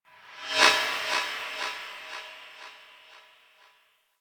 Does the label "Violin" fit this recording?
No